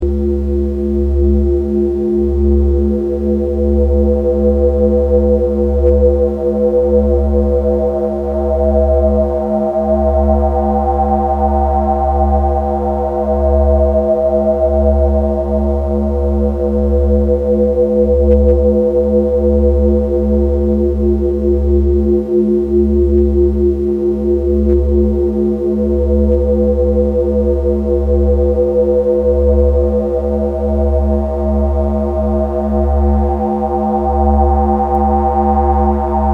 Four Voice Drone W Reverb; analog synth

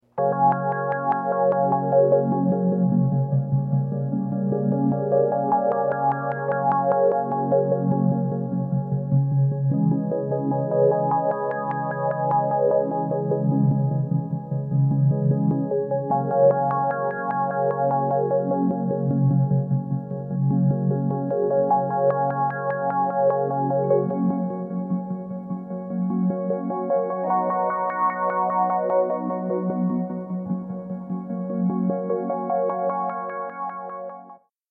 organ svvcf lfo lfo; analog synth; ultimate expander

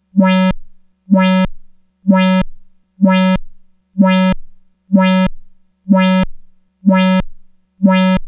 vcf ar slow attack fast decay high res; analog synth 101

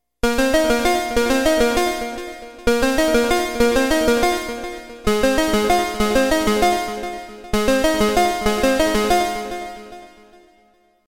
Blip solo; synth; analog synth; Penetrovisk

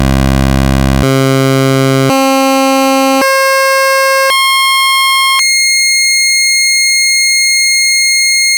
vco pulse wave, analog synth; analog synth 101

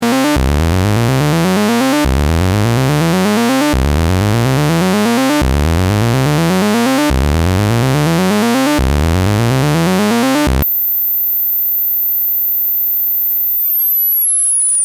vco sawtooth sync; analog synth

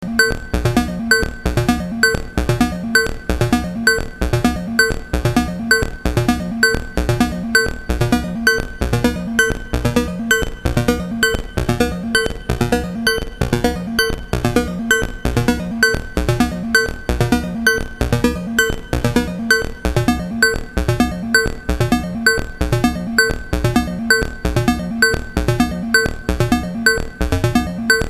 sequencer, analog synth; ten step sequencer

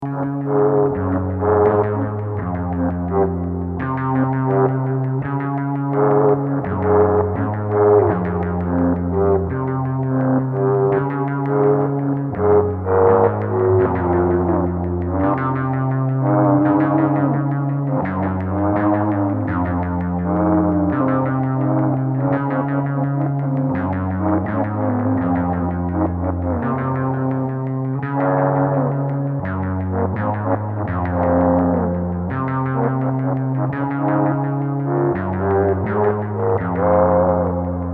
Guitar, guitar trigger; analog synth; sound lab mini synth

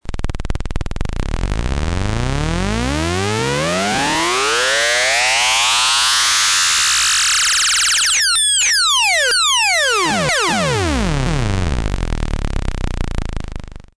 mini fm, analogsynth

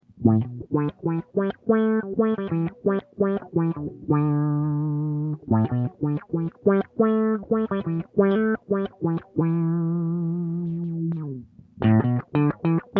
guitar ef adsr lp; analog synth; ultimate expander

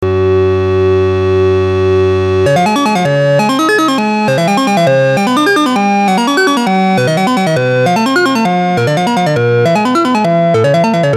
sequencer ex start; ten step sequencer; analog synth

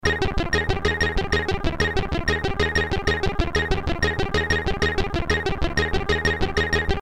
S and H; Sound w/Sample and Hold; analog synth; Alan McKerchar

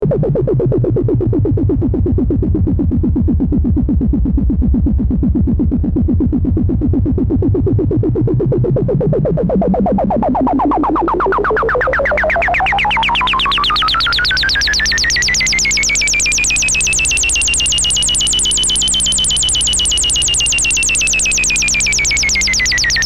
full res adsr lfo BP; state var vcf; analog synth

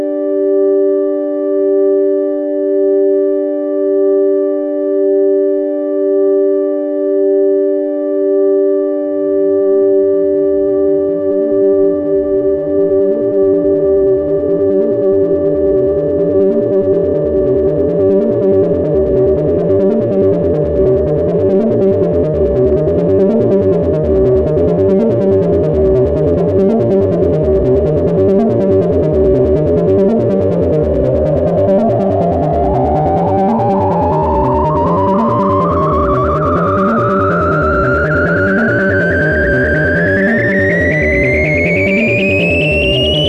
sine chord then sequencer; analog synth; quad timbre bank

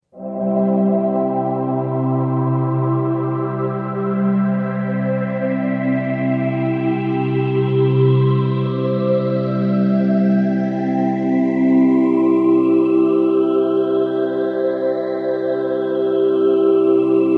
Korg layered choir strings reverb, phase shifter

